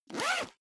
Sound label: zipper backpack open fast